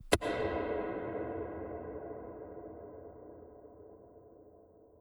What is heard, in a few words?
pickaxe reverb; seeding-planets